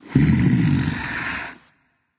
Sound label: thinking; cerberus